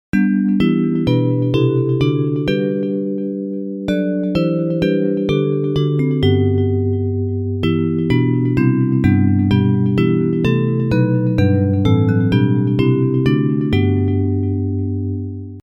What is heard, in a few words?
Bells, Traditional hymn